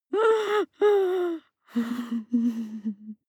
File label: breath female whimper breath